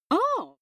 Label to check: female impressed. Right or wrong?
Right